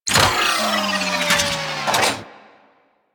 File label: Crp Ship Rolo Dex Close B; Ambience; Props; Warframe; Corpus Ship Remaster